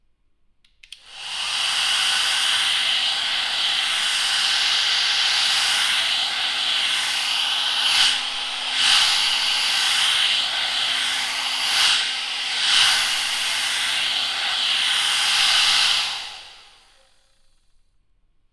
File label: Hairdryer